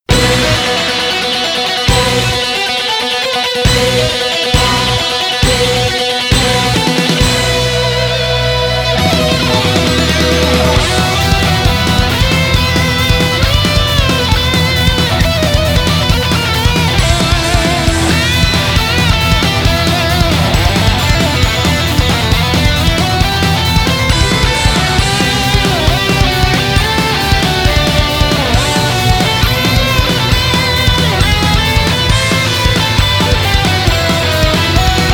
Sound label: Battle Furious GUITAR loop; Star Gods